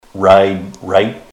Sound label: ride right